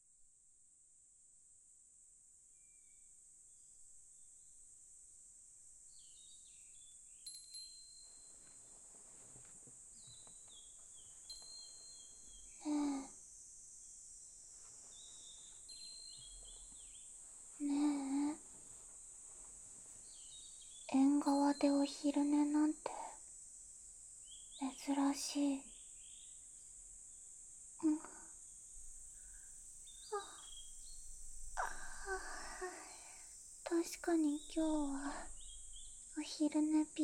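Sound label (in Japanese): 耳マッサージ（BGMなし）, ASMR; 効果音同時収録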